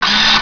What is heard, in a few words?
droid up; Shadows